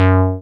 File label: SYN TBM G3, MC202; Sound & Vision - Gigapack I CD 2